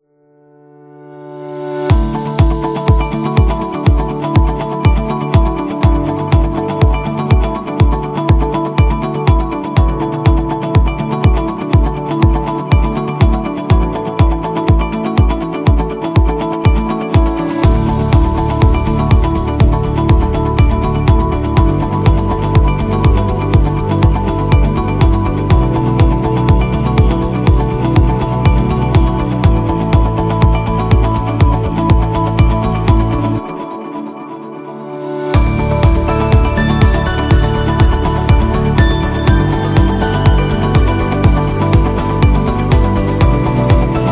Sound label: This Corporate; inspirational motivational